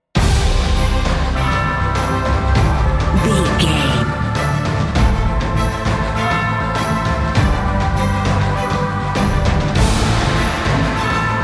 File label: epic adventure